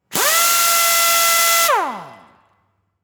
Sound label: Industry Tool Air Gun Run Long Close